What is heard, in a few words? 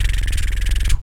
VOC PERC, Vocal Planet Jazz FX BASS PERC